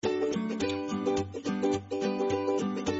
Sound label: firefly tune